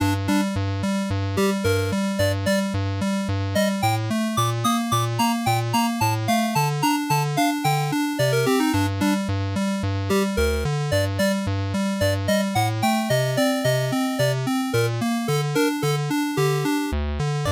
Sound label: Desert March